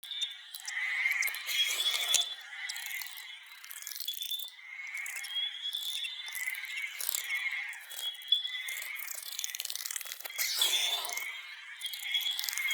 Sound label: Critter Loop, Root Cave Ambience; Neathuns